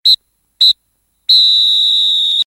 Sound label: referee end whist; games; ringtones